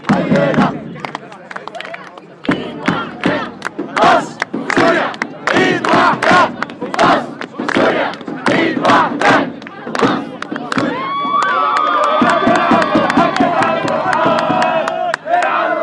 girl with headscarf leading the protesters chanting